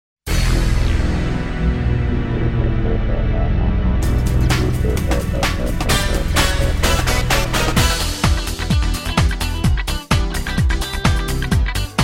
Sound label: karaoke